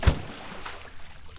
water slap; E-MU Producer Series Vol. 3 – Hollywood Sound Effects; Water Slaps

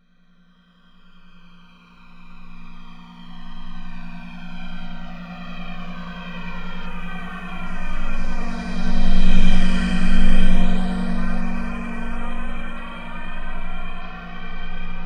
SPACEPROBE, Anime Sound FX; FLYOBJECTS

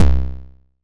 Kick, Drums; Bitkits

